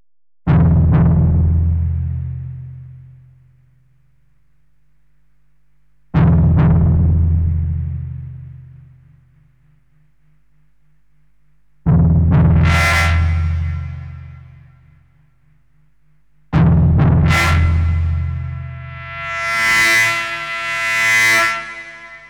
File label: Japanese Expressions Of Guitar Solo